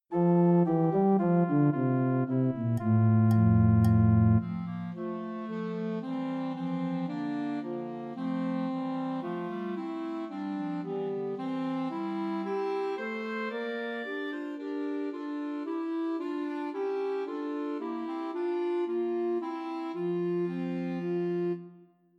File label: Trios for Two Clarinets and Alto Saxophone; While Shepherds Watched; Christmas